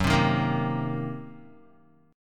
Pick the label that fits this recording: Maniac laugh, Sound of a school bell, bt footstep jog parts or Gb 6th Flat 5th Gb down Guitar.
Gb 6th Flat 5th Gb down Guitar